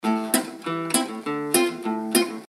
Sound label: Rebab Jam Guitar 99bpm